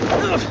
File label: Emypain